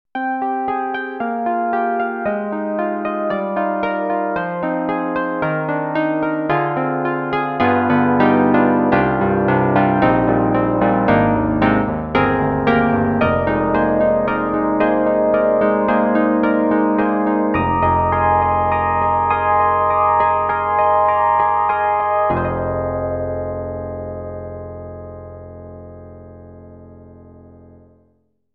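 EDIROL SD-90, Classical, Electric Piano, 60's Electric Piano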